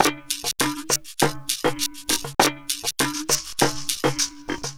Shaky Groove, Electronica Composite Beats; Sound Effect Library

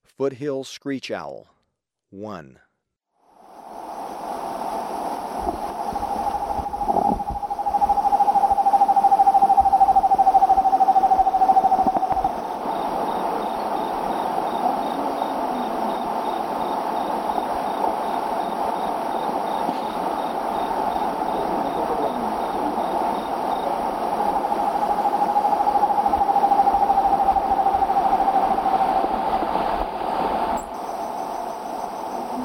Foothill Screech-Owl, Birds of Ecuador; S. America

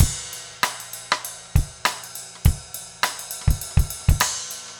basic funk 100 rims

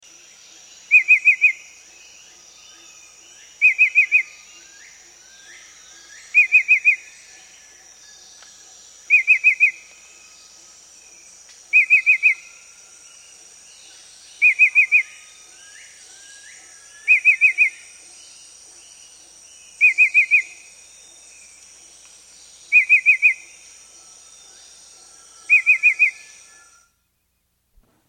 Green Shrike-Vireo; S. America

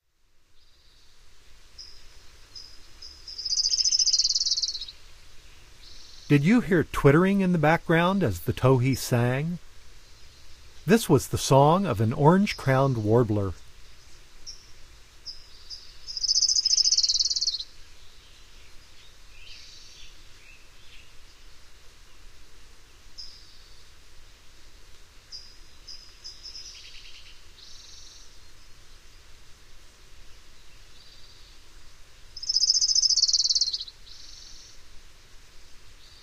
Orange-crowned Warbler; North America; Common Western Species; Know Your Bird Sounds

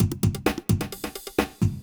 electro kit 130

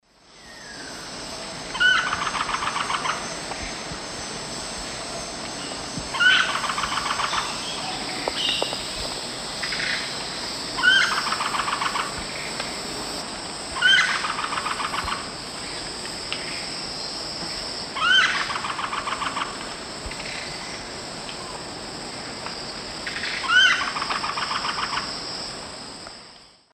Celeus spectabilis, call; S. America; Eastern Lowlands

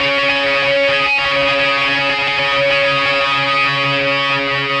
FX Guitar; Guitars

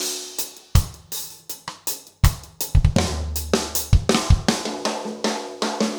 reggae

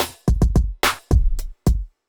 clapper 108

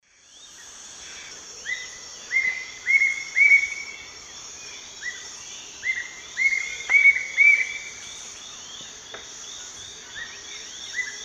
Attila spadiceus, song; S. America; Eastern Lowlands

